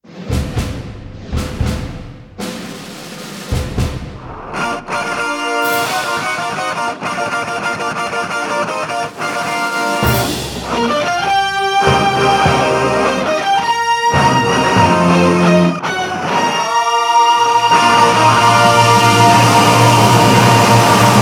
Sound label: Fanfare